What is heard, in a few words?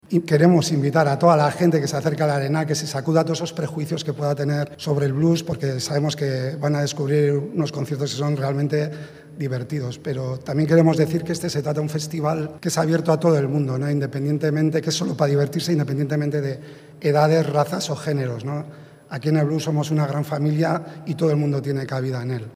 blues